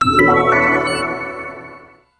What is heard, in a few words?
music stone yes